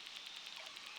Background Noise Clips

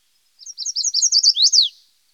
Chestnut Sided Warbler

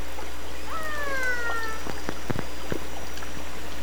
Transient, Sea Sounds

